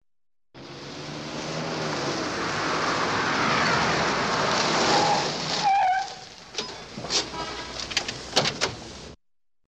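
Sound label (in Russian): торможение остановка открытие двери авто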